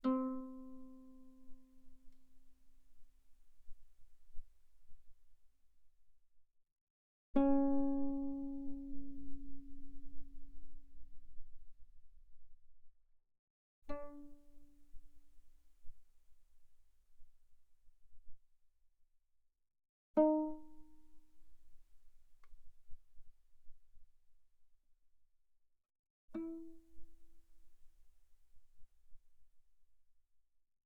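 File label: Guitar pianissimo sul A C4 E4; mono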